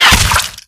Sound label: bullet impact, gore; npc; bullets; Left 4 Dead 2; Source Engine; infected